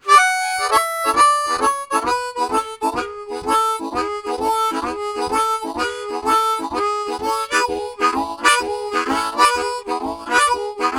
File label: Repeat Boogie, Harmonica, Riffs; Zero G